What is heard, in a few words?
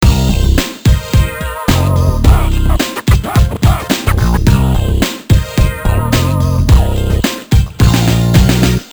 depressing rock music